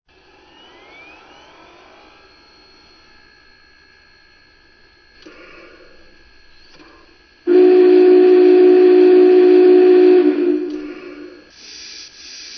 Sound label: BigBoy; 4014